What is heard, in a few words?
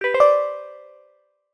account action notify